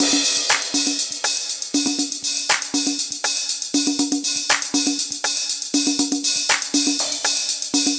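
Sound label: drum loop 120; House; Best Service ProSamples vol.10 - House; AKAI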